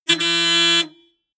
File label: notification honk, autos